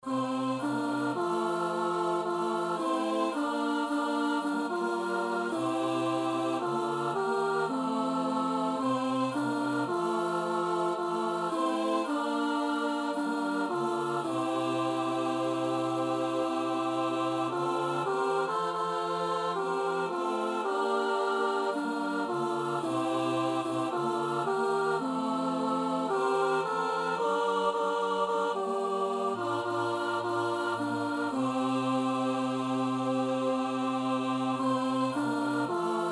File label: Chorus